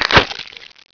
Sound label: bite strt, voreling; mod quoth